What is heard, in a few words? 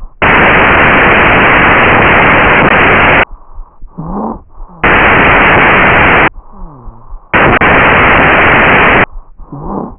stridor, heart sounds; Recorded over the trachea of a 15 month old girl with croup.